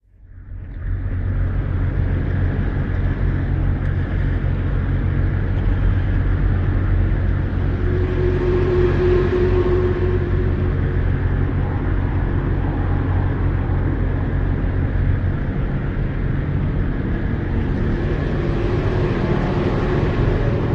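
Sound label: Cargo Room Ominous Reverse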